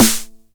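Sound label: 909 SD Tape, snare drum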